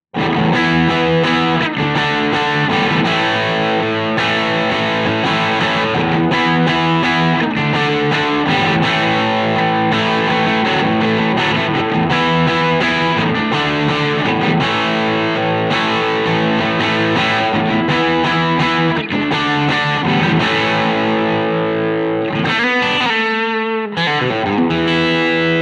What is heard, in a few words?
crunch; jensentone